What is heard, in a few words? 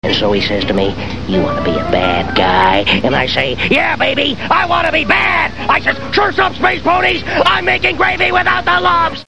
bomber; TheTick